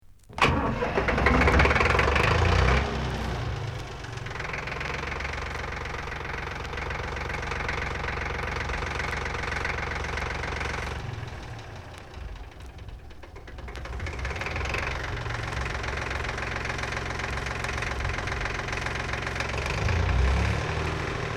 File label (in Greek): ΤΡΑΚΤΕΡ